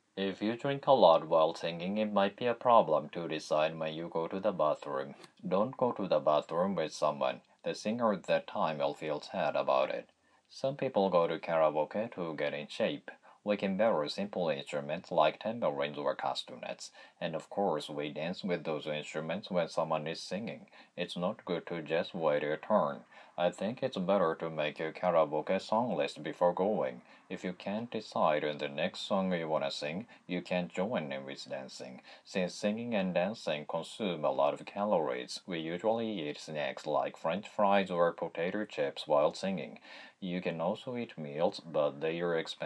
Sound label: karaoke